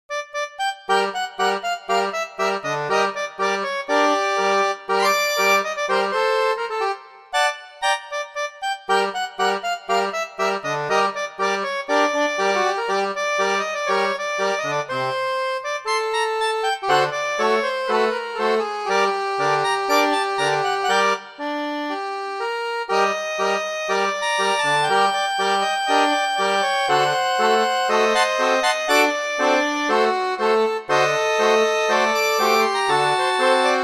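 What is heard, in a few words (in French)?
Le tango corse, orgue de barbarie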